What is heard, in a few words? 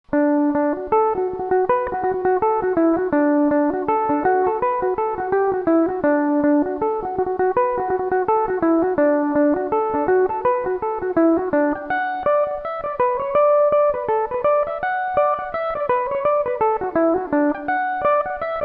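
D major, mandolin; miss ramseys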